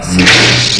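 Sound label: crowbar hit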